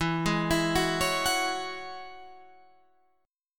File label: E 6th Add 9th E, Guitar Standard; E6add9 arpeggiated; JGuitar